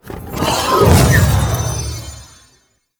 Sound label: Treasure Ability Cast; Warframe; Warframes; Helminth Abilities